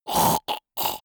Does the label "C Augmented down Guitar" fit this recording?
No